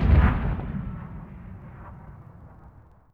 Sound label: distant gun; WAR